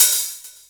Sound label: hi hat open, Korg Electribe ER-1; Kit 7